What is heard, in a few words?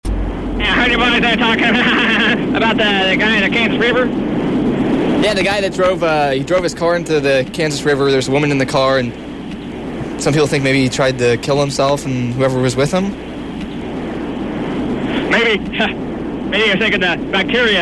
death laugh